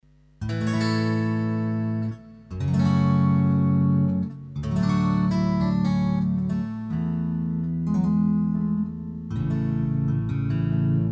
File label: guitarist